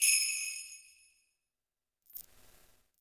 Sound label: percussion, Roland TD-20